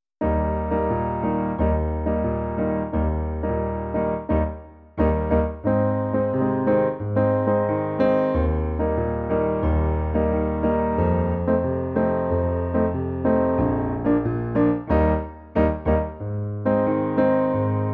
xote

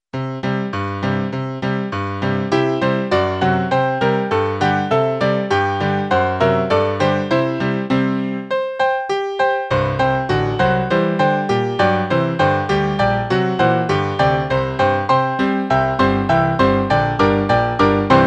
piano musical inicio

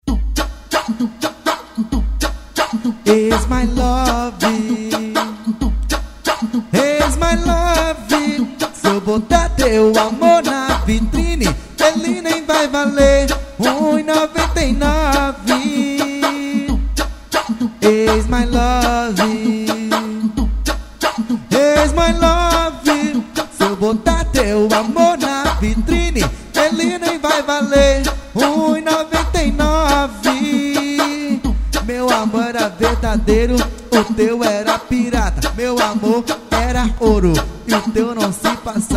funk

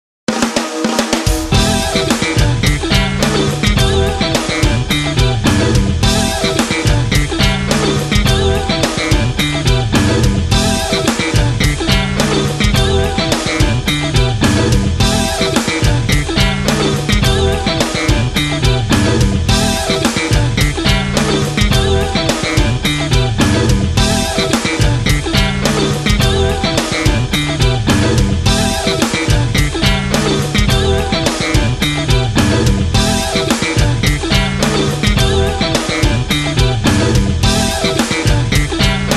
jingle

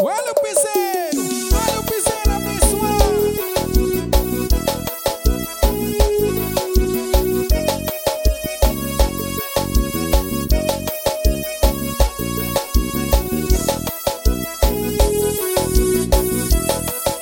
piseiro gospel

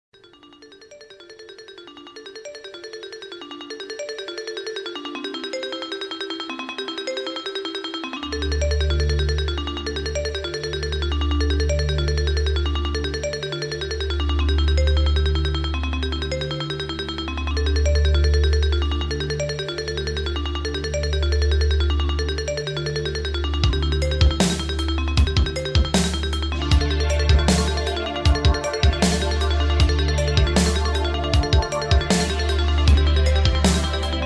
ritmos Yamaha

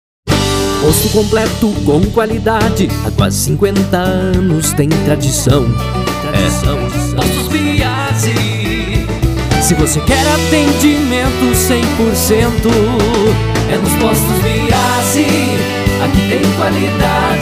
jingle postos

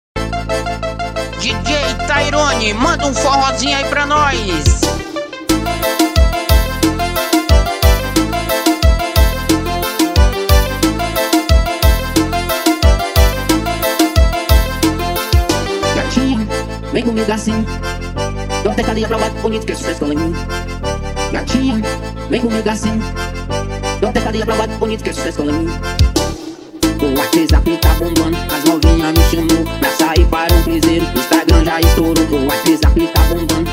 forró remix

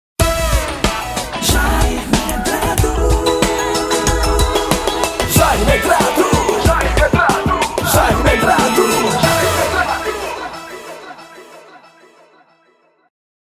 vinheta cantada

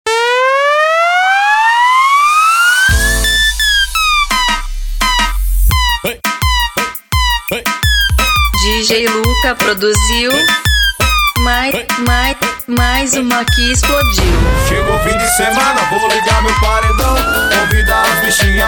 forró brega funk remix

